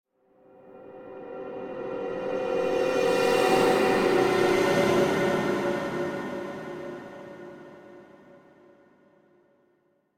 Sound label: Drone Metallic Musical Eerie Tone; Hollywood Edge; sound designer's toolkit v2